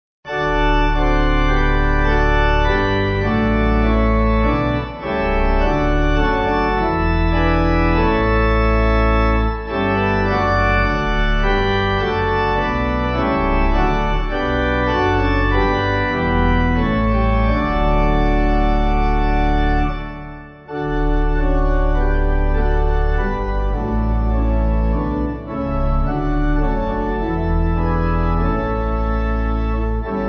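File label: Pipe, hymn backing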